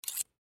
Scissors Close; Office; foley; Offices; Blastwave

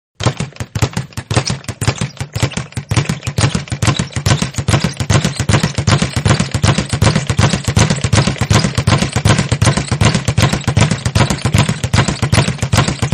Boxing Speed bag using both hands; Boxing (Sound Effects:Sports)

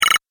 Game Power Button, video game

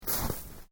Footsteps Boots Snow Step; Footstep Foley; Human

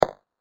Wooden Mallet Hitting Wood Post; Wood Sound Effects

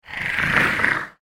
Tentacle Horror Attacks, monster sound; Monster Sound Effects

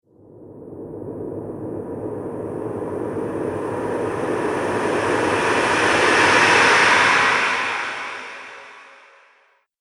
Whoosh; Maximus; Whoosh Sound Effects; sound design

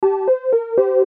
CHIME SOFT MELODY; Blastwave

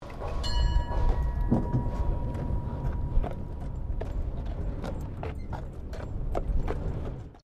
Tram Horse Drawn Bell; Horse drawn tramcar; Carriages (Sound Effects:Vehicles)

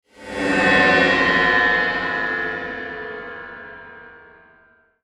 Descend Hollow Tremolo; Blastwave; Descends

